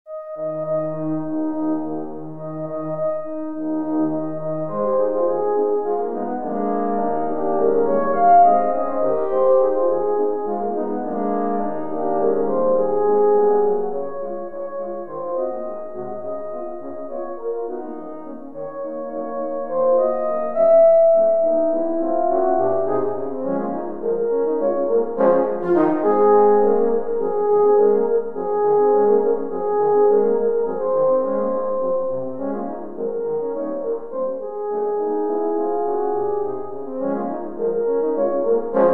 horn quartet

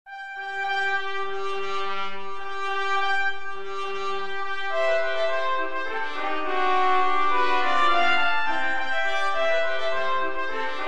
trumpet trio

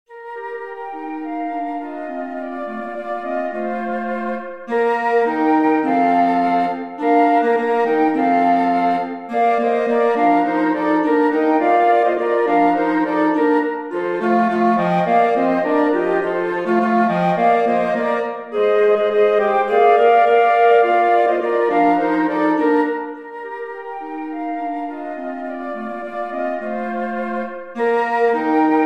woodwind quartet

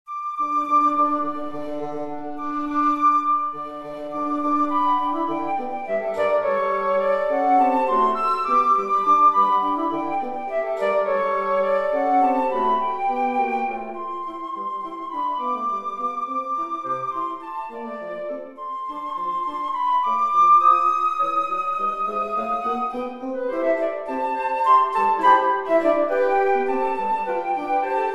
woodwind trio

